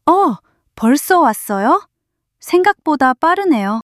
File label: surprise